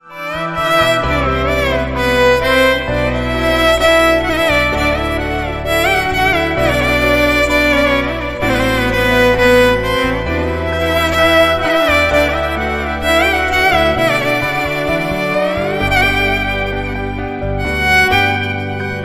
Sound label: violin ringtone